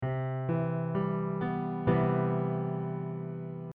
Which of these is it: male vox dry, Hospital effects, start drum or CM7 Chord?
CM7 Chord